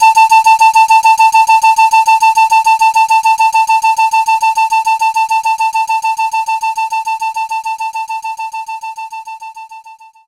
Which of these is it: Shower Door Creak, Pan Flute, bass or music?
Pan Flute